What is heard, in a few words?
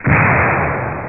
tank